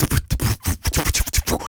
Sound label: Human Beat Box, 140; Loopmasters